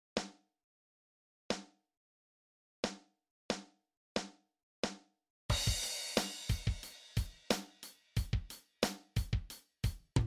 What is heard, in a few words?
Drumset